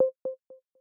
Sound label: countdown beat; iOS 27